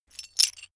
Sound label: metal pieces clatter short